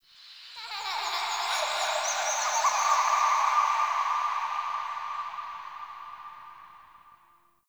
Zariman Park Memory Echoes; Warframe; The New War; Ambience

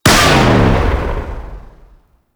Sentient Sniper Shot; Warframe

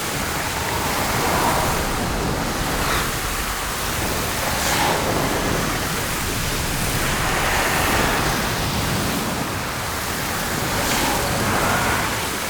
Hazard Fracture Loop Toxin; Warframe; Duviri; Undercroft Hazards

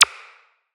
Button Press

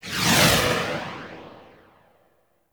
Eruption Active Move; Warframe; Iron

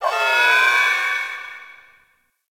Ship Metallic Data; Warframe; Corpus Ship Remaster

